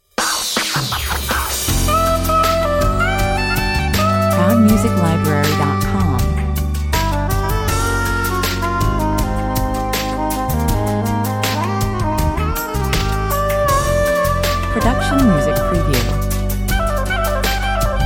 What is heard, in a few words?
soprano sax 80 bpm